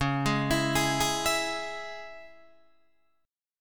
Db Minor Db Guitar Open D

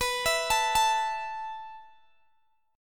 B 7th Suspended 4th B down Guitar Open G

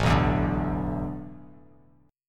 A Suspended 2nd Suspended 4th, Bass Standard; Asus2sus4 strummed

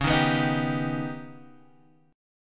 Eb Augmented 7th

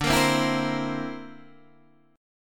D 7th Suspended 2nd Sharp 5th D, Guitar Open D

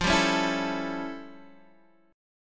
A 7th F, chord; A7/F; strummed; JGuitar

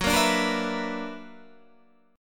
B-Minor Major 11th

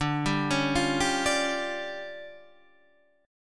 D Minor Major 7th Double Flat 5th D Guitar DADGAD

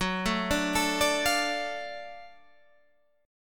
Gb Minor Major 7th Sharp 5th, Guitar, Standard; arpeggiated; 14,12,12,14,15,13; JGuitar

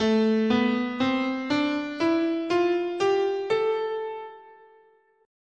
A Aeolian, scale

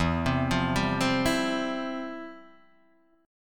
F Major 7th Suspended 2nd, E, guitar

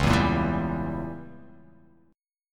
C sharp Diminished 7th C sharp down

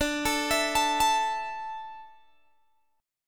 D Suspended 2nd D, down, Guitar Open G

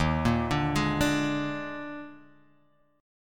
Eb Major 7th Suspended 4th Eb, Guitar, Drop D

